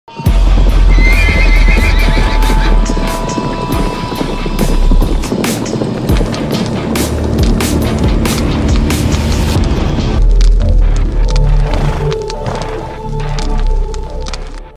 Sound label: Cinematic Music; Apocalypse